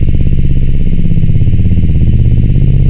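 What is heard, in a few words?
BIKE ENGINE; forsaken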